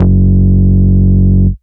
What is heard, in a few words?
Root F#, Bass; Vengeance Samples